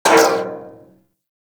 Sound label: Bullet Impact Debris Pipe; Warframe